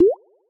Effect Tick Unique; LG Wing 5G Verizon

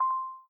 Video Record, ui

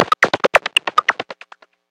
Beat Box, notifications; Pantech Pocket; Android

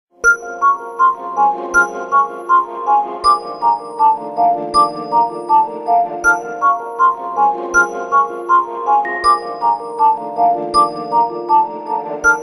Plastic, Nokia Tones

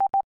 Temp warning double beep; Samsung Z4

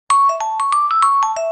Bell Ditty; E-TEN M600

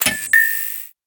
bulb one, notifications; Nothing Phone (1)

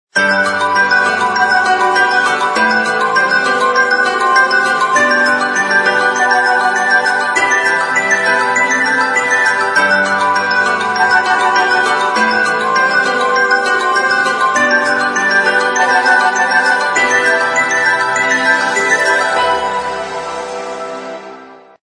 Morning Call, LG9600 Versa

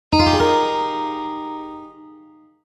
Shine, notifications; Sony Ericsson; A8i

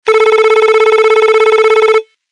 Low Beep; LG GW525G Etna 3G

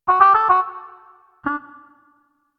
Little Trumpet; InFocus M320S

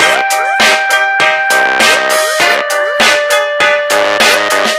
Love Flute, ringtones; Samsung Galaxy S CDMA SCH-i909

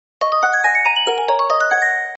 message alert

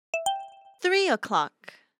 Hourly Alert

